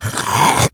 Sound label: attack; slender; zombiebm